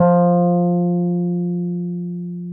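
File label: Wurlitzer electric piano; Sonic Reality; Vintage Timetraveler; WURLITZ EP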